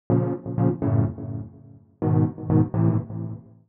DEEPHOUSE ORGAN 125 A; Keys

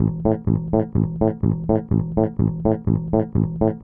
DEEPHOUSE BASS 125 A

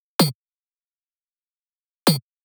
drum loop 128 Perc; Anthemic

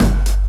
KLAPP BASS, loop; 115-121 BPM

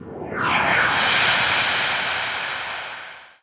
TECHNO FX 140

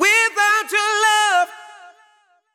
deep house male, 125, A, vocals

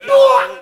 CHATTER SHOUT; ETHNICSTUFF

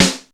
RIM

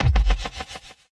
Kick; BlissedOutBeats; Touch Loops; Drums

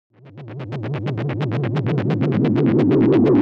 SPACE FX 140; SpaceSounds

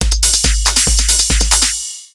TECHNO BEATS 140

